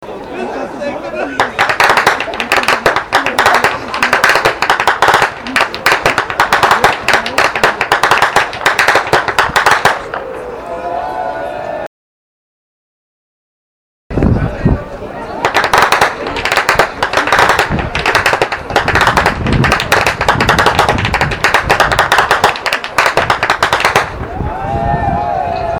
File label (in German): schnalzen; jugend; feldkirchen